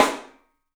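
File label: PICOLO SNRS, snare, drums; 3D Sound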